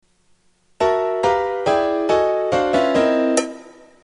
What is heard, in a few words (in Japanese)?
quiz hazure